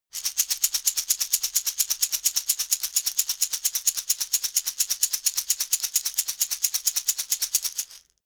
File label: shaker wood rattle slow hard